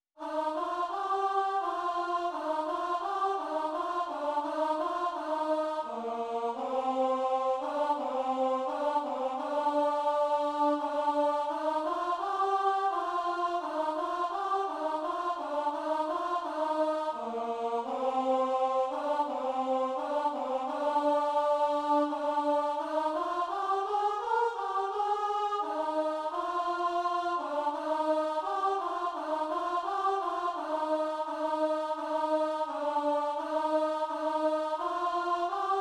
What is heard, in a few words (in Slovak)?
alt, zborove skladby; vianocne